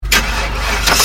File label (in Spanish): Marcha pesada